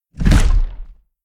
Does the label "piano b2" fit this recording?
No